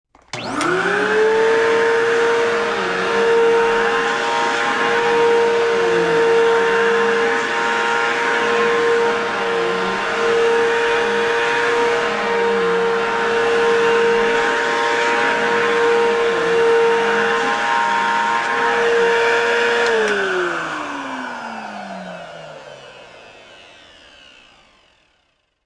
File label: vacuum cleaner